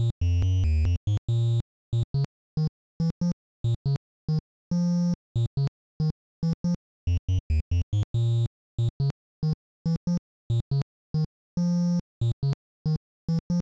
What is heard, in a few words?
triangle wave